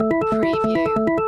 Casino Slot Machine Organ Loop

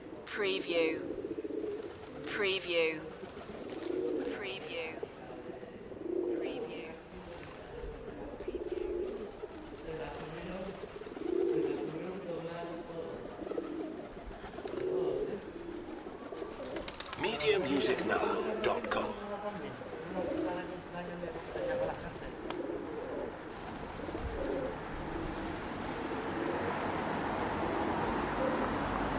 side street doves

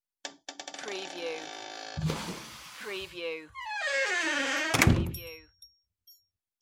Wooden Door Close With Door Creak; Sounds of doors and windows opening and closing, keys locking / unlocking doors

